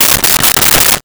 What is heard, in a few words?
Electric Staple Gun 5 Times; Tools